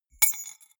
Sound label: key ring small drop stone hard